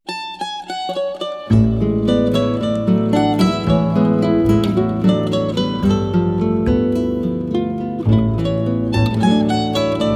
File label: choro ver.; ARIA